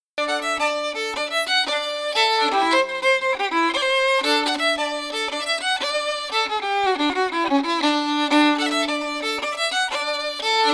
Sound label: fiddle; new rigged ship